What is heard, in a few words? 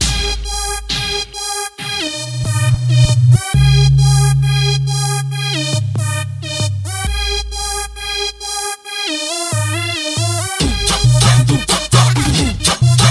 funk bass